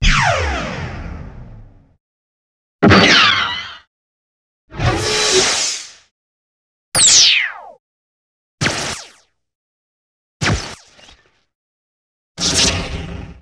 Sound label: Blast & Phaser Assortment, Sci-Fi; Sound Design